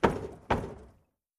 Barn Door Pounding Cu

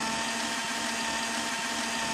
Torpedo Motor Mixer, drills

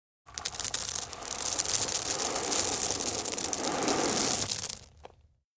Rock Gravel Pour Rock, rock exterior; IMPACTS & CRASHES - ROCKS & SAND ROCK: EXT: Gravel pour, small rocks sliding out of container, landing on other rocks.

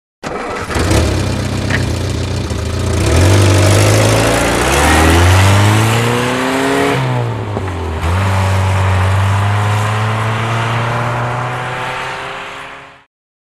Start Away, austin

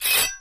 SWORD SHING, swords

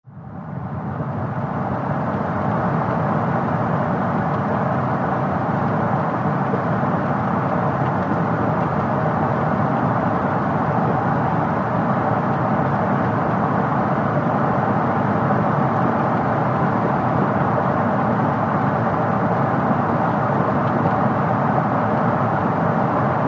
Sedan Drive 35m Stop

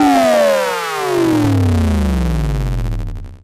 engine rev down, engines

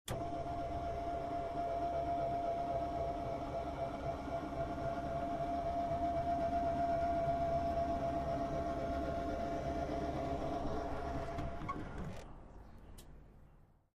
Mechanical Hydraulic, hydraulic lifters, truck

